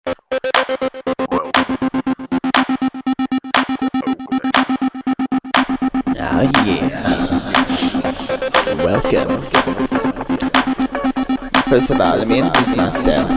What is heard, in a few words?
Drama Strings Mix